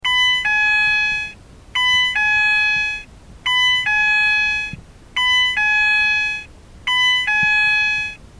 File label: Ford Fiesta Warning Tone Inside